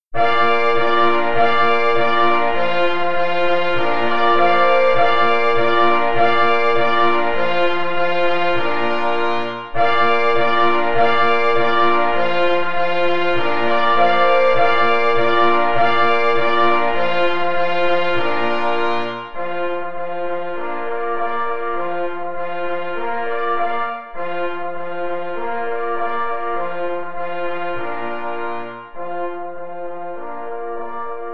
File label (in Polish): 2 plesy i 2 parforsy; Posokowce gora